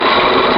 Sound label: grinding